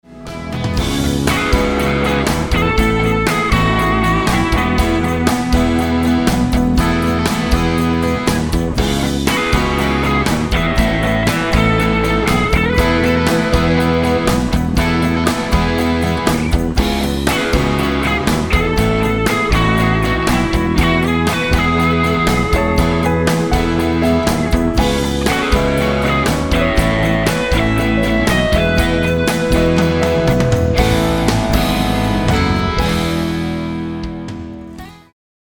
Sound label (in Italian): chorus due linne di basso